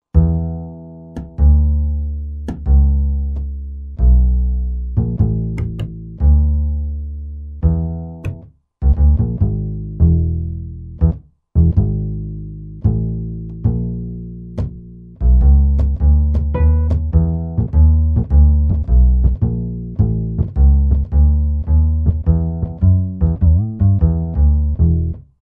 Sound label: Acousti Touch; Roland SR-JV80-10 Bass & Drums